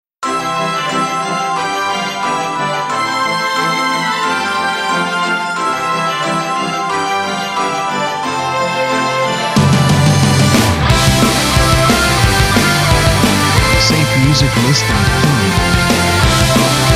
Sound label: Indie Rock Christmas; SafeMusicList